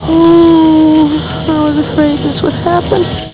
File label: ooooh; destiny